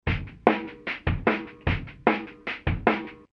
Roland S-330 Sampler Drumloop 15 kHz LPF

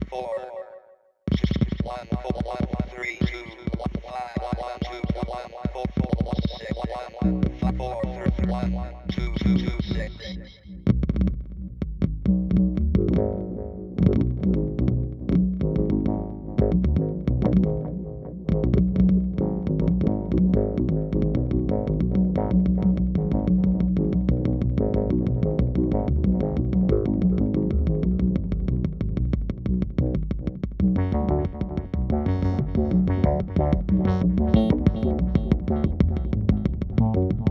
Poly Effects Beebo Chaos Controller

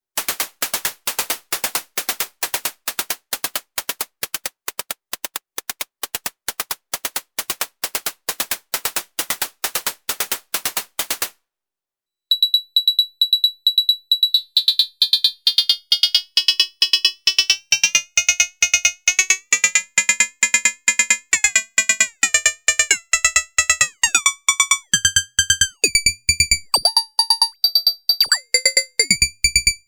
hihat

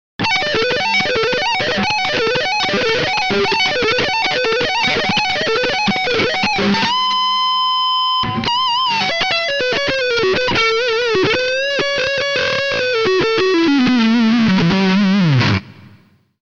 Rectifier Leadsound Dry

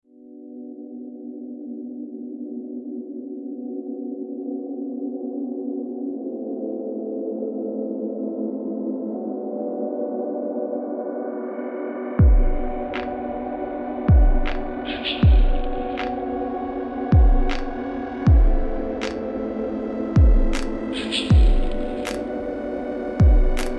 Dark Circuit

